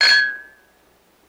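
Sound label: Glasses Clank Together, glass clinks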